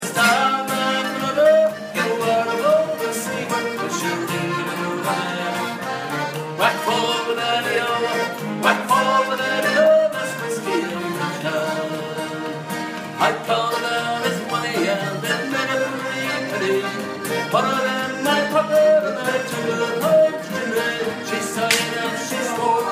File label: Irish Pub Music